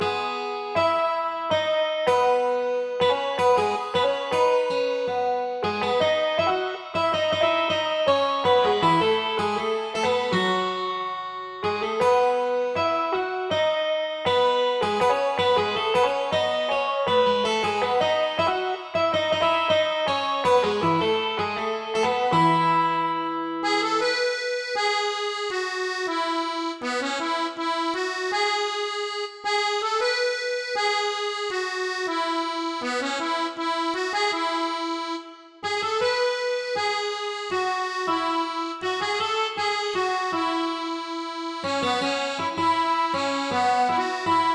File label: Town Melody; backpack hero gamerip 2022